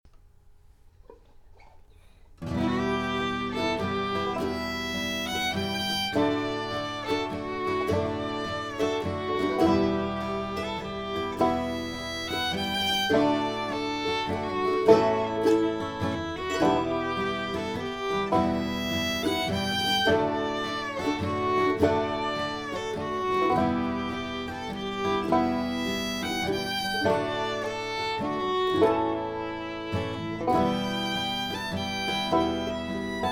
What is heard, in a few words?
Harmony; Arran Boat Song; Full Jam